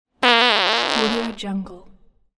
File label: farting